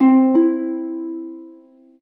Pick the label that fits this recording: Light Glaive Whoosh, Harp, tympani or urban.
Harp